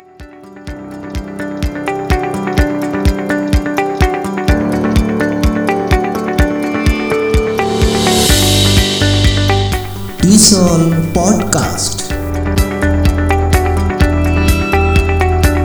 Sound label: pod tune